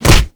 Brawl Punch B; Warframe; Brawling

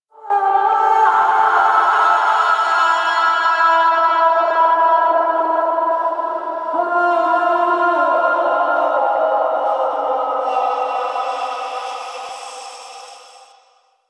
Energy Thread Music; Warframe; Apostasy

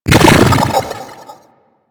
Shield Deactivate; Warframe; Corpus Nullifier

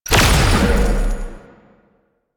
Shot Large; Weapons; Warframe; Duviri; Incarnon Adapters

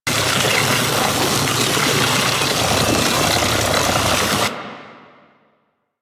Beam Pistol Loop; Warframe; Weapons; Infested

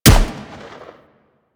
M16 Rifle Shot; GrineerM16Rifle; Warframe; Weapons

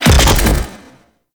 Wall Eye Explosion C; Warframe; Ambience; Sentient Devourer

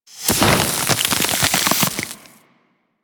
Vent Freeze; Enemies; Abilities; Warframe; Corpus; Arachnoid Camper Terra

